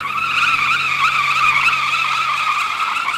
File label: pavement skid loop, vehicles; aronwk collection; ndaudio